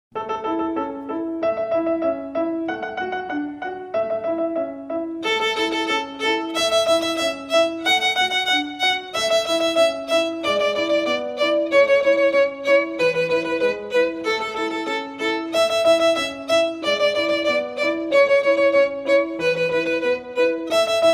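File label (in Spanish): Twinkle Ritmo A Normal, violin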